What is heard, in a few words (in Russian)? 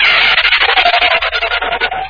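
пробуксовка колес из мультика; probuksovka koles iz multika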